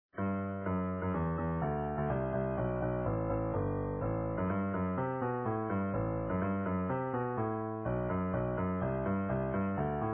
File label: bass modes